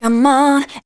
voice spectral, ly short

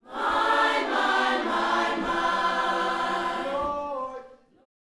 Vocal Gospel PHRASE

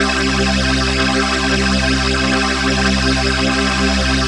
PHASE PAD, ATMO PADS; Best Service; XX Large

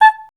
A5, Voice Spectral

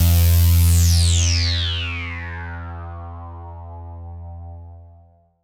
ATMO PADS; Best Service; XX Large

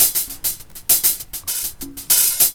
LOOP; E-Lab; X Static Goldmine 2; akai

